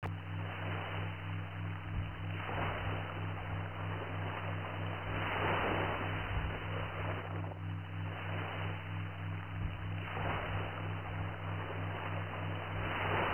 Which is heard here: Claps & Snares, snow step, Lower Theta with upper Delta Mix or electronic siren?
Lower Theta with upper Delta Mix